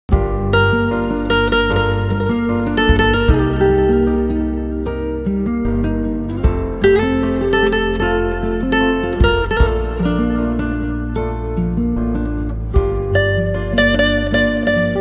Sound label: Guitar